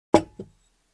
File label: Drinking Glass put back